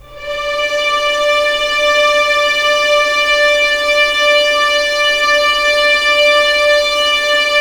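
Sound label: D5 legato mf violins, right; East West; The Ultimate String Collection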